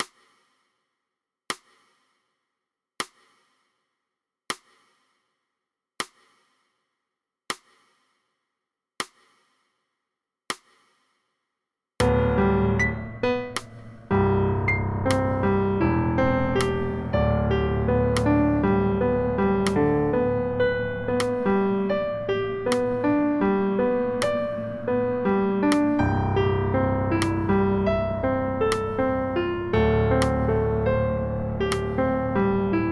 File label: piano solo